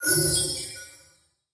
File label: Magic Spell Heal